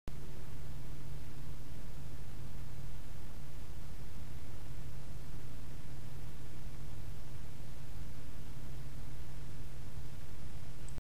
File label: Gelid Wing 12 PL 7V